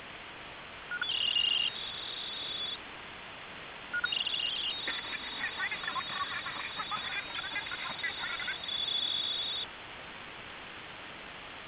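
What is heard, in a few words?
hf scrambler; tadiran; 8045khz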